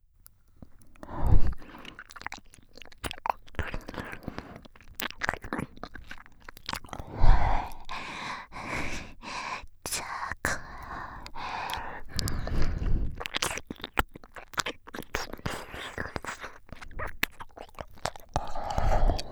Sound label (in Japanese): 密着耳舐め雑魚ちんぽ煽りループトラック, ASMR; メスガキ魔法少女サーリャちゃん